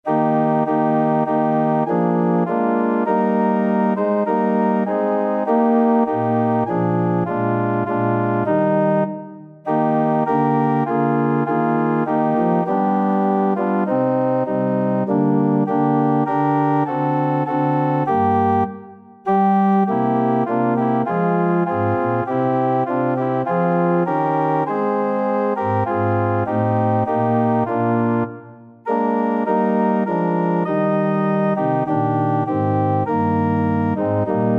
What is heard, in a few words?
found a friend, organ